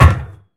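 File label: stalker footsteps metal; Monster Within; soundmorph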